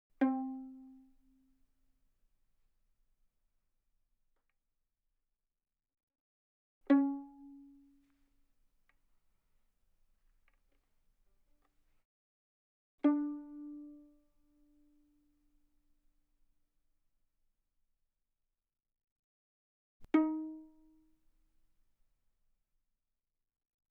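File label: Violin pizzicato fortissimo sul G C4 C5; Strings; violin2012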